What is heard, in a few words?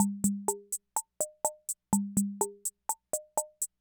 Loop, classic machines; arranger